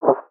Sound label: UI free trial max coins; aronwk collection; ndaudio